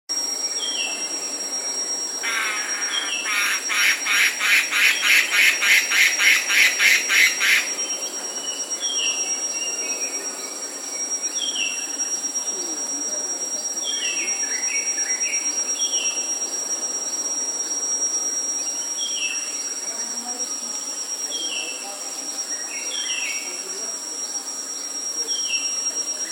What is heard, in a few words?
Canto do black and red broadbil